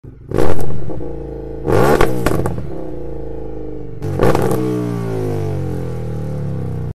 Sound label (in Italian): Motori